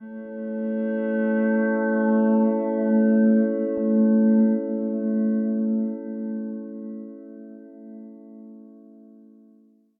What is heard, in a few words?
ambi glass hum